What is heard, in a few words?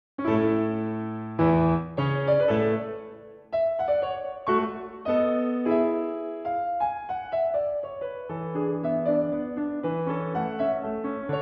Piano Sonata No. 11 in A Major, Minuetto